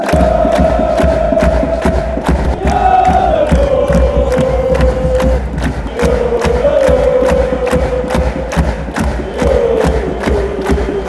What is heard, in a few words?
chant, fan chants; vitesse